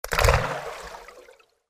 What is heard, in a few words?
stone falls into the water